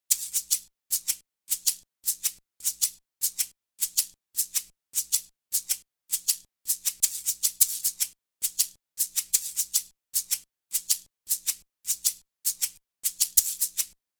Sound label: Shaker